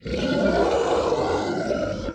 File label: ship engines; World Of Warships